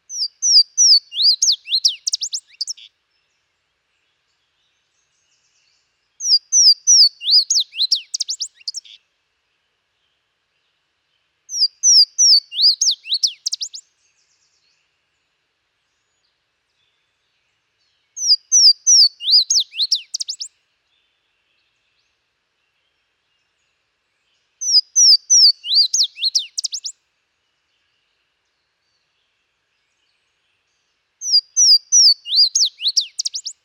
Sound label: waterthrush song, louisiana waterthrush